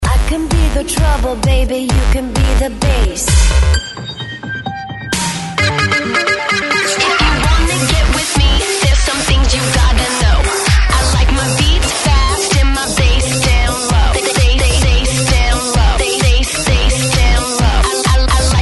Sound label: Big Fat Bass Drop Down Low